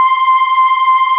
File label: SYNVX; Greytsounds; Scott Peers Sound Engineering